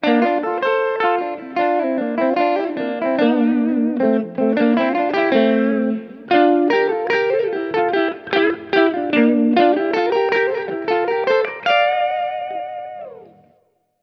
Intelligent Harmony Mode 3rd Down Minor

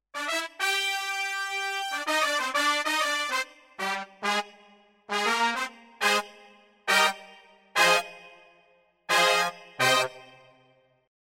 VR09 Brass

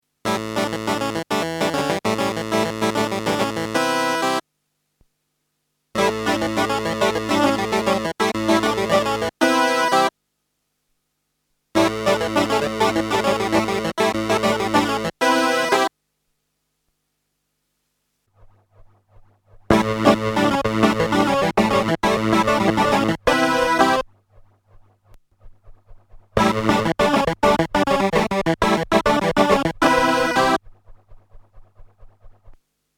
Juno60 Stereo Clavinet; June60

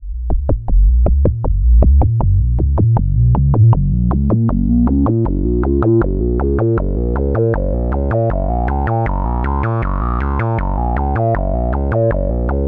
Liquid Foam Loop; HerbsAndStones

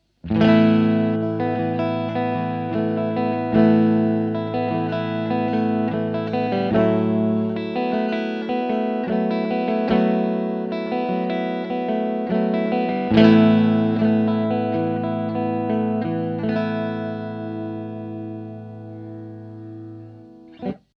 Neck PU Clean

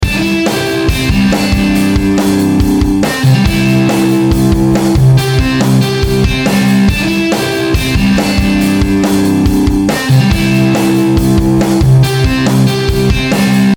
Rockriff; Logic

